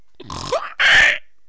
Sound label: pig attack; megaglest